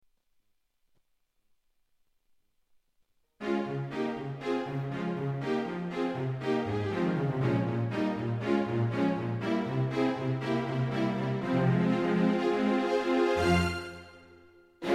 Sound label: Mega Voice Strings; tyros2